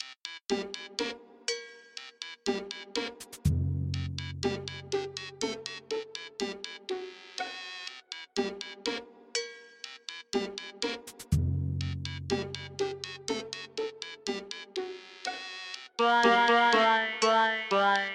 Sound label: Ska